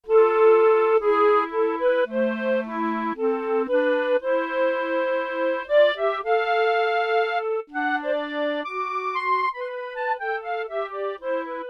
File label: Ensemble, Bb Clarinet and Clarinet; Yamaha TX16W